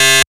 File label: breakbeat